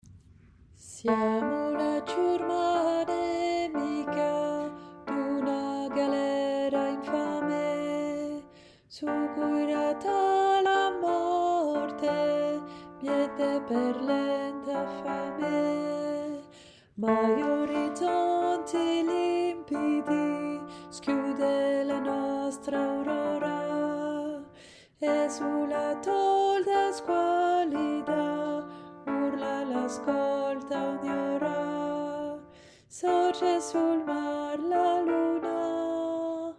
VOIX Mezzo, chorale; LA CHORALE ANARCHISTE

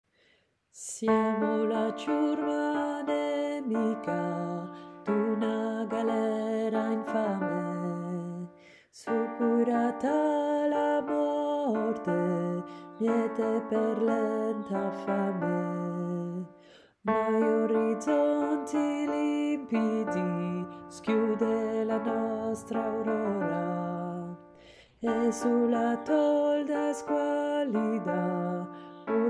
VOIX Alto, chorale; LA CHORALE ANARCHISTE

